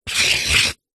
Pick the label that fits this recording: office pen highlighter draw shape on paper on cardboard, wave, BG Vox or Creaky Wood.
office pen highlighter draw shape on paper on cardboard